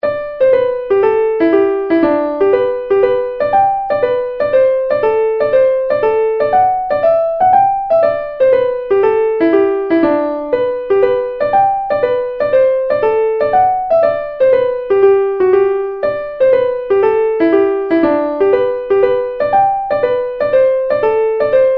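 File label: Hornpipe, Traditional Irish Music; Egans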